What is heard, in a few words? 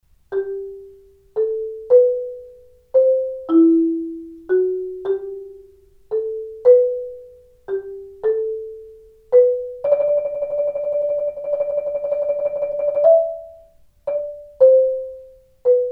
mallets